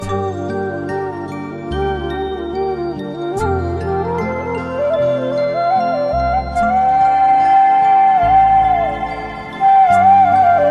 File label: Flute